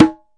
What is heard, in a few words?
ROLAND SPD-20 PERC TABLA